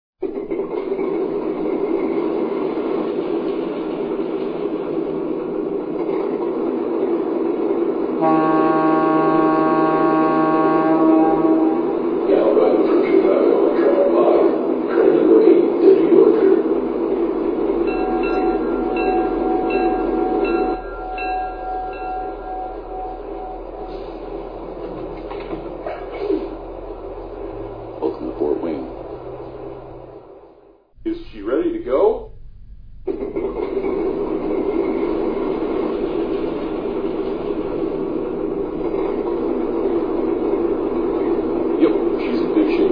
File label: Alco PA, electric trains; NKP